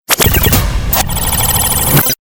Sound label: Galery Noise; Sound Ideas - Galery Noise 001